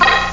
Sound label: beep displaying; Super Games Pak (1991)(Odyssey)